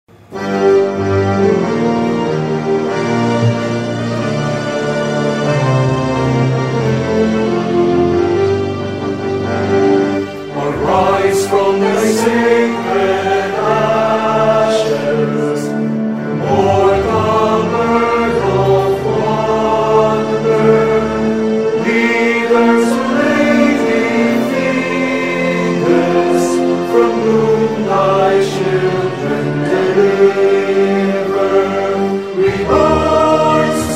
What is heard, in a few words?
Hymn